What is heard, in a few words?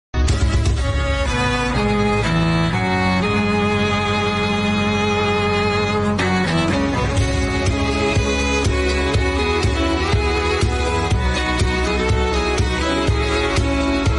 instrumental violin